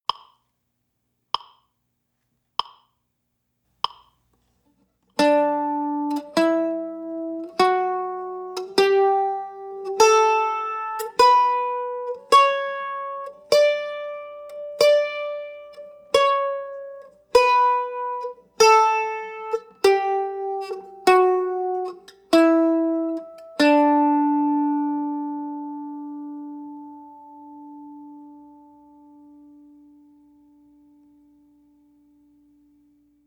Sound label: D Scale, Mandolin